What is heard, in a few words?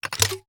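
foley assault rifle akilo47 grenade launcher reload chamber; weapons; viper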